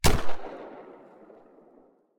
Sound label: revolver single fire; ranged weapons guns; psfx